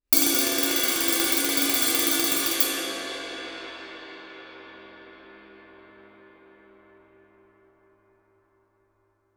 crash stick roll, Suspended Cymbals; Percussion

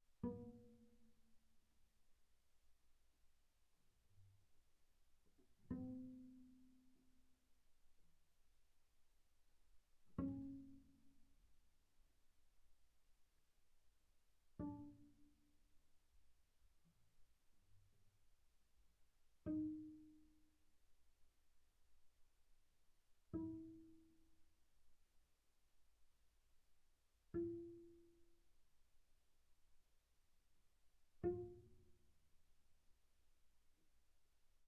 Bass pizz sul G pp B3 Gb4, double bass

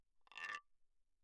guiro, away, pp; Percussion; hand percussion

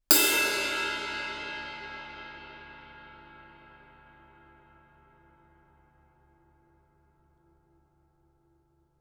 crash stick normal ff stereo, Suspended Cymbals; Percussion

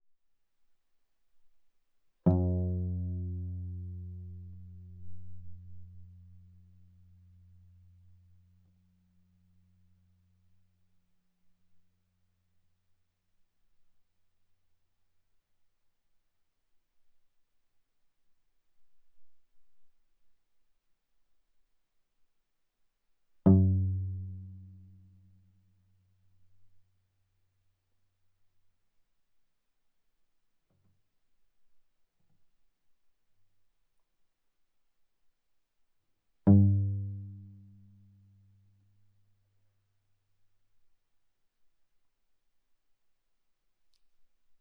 Viola pizz sul G ff G3 B3 stereo

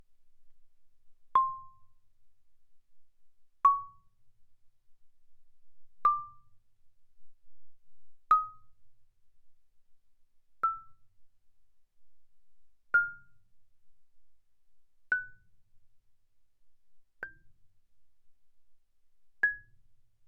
Marimba cord mf C6 B6; Percussion

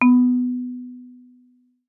Marimba rubber ff B3; MIS Pitches - 2014; Percussion

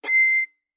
Violin arco ff sul E C7; MIS Pitches - 2014; Strings

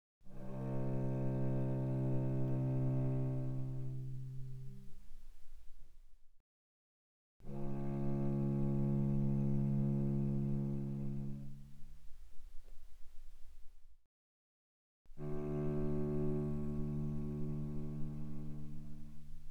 Cello arco pp sul C C2 B2; mono; Strings

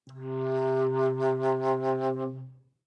Bass Flute ff C3; Woodwinds

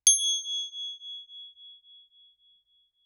Crotale ff Ab7 stereo; Percussion; Crotales

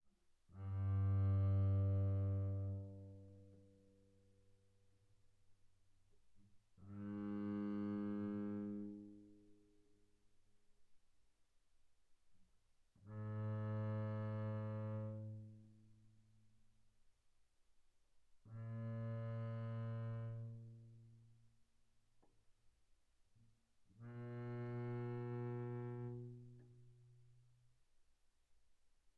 Bass arco sul G pp G2 B2, double bass

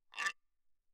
guiro, away, mf; Percussion; hand percussion

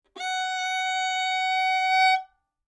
Violin arco ff sul A Gb5; MIS Pitches - 2014; Strings

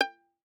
Violin pizz ff sul E Ab5; MIS Pitches - 2014; Strings

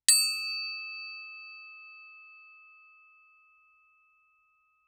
Crotale ff Eb6 stereo; Percussion; Crotales

